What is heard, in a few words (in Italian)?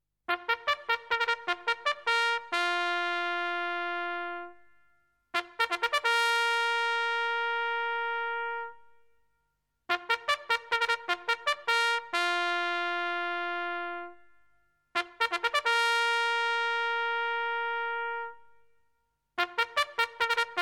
suonerie tromba militare